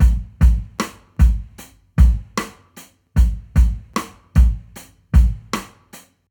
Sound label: 76bpm bop drum loop 8th groove; Hayman